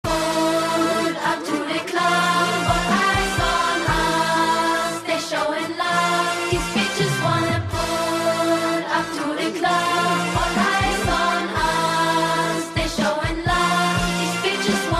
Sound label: CHILDRENS CHOIR; VOL.4 Sample Pack